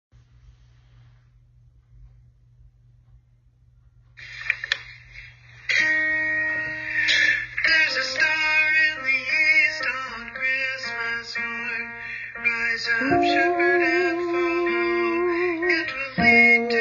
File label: lead w bass